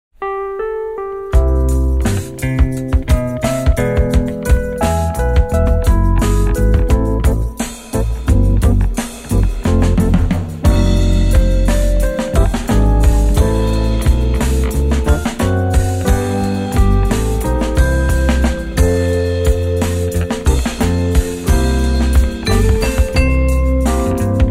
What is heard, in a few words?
Quartet